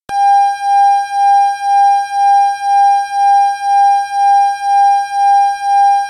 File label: Beats